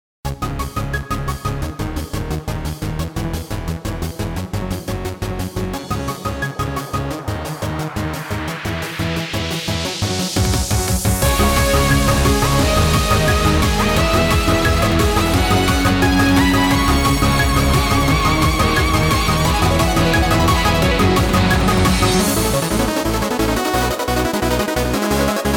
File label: trance